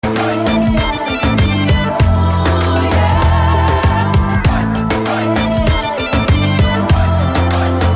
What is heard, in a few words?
Music Sms